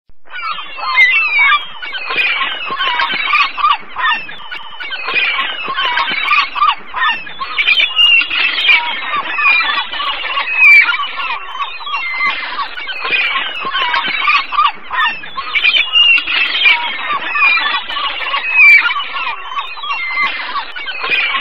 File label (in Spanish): Playa con gaviotas